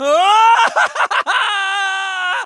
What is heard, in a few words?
fulton scream; mgsv